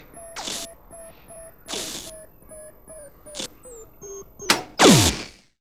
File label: drop mine; wob2 demo